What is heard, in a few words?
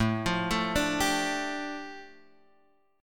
A 7th Suspended 4th Sharp 5th, A, down, Guitar, Half Step Down